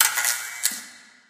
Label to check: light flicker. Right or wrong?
Right